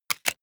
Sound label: plastic device handling lock double short